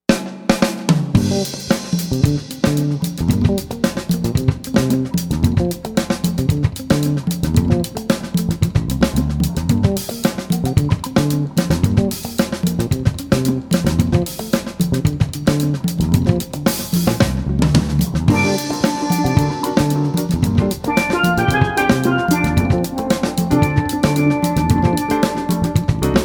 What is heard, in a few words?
Caribbean Jazz